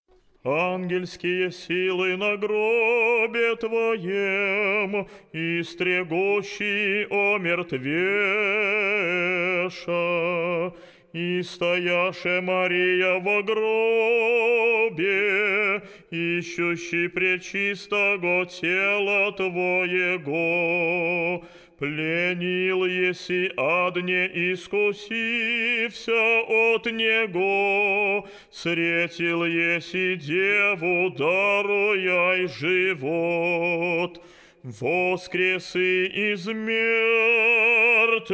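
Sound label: troparion resurrection tone 6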